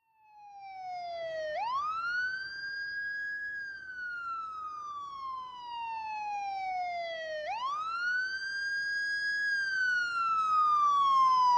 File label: Ambulance By Slow